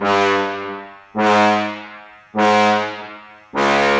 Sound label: trombone; blocks neural instrument coding